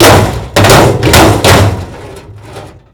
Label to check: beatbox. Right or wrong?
Wrong